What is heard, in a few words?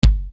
Bass Dr, EuroDance Bdrums; Best Service; Ethnic Journey Sound Cube; EuroDance Essentials